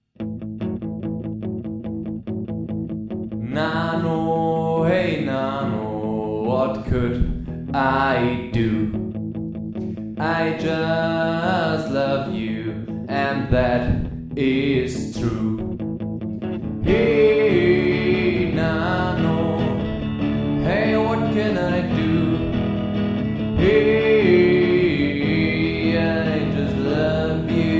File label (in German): song